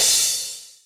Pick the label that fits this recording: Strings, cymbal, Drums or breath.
cymbal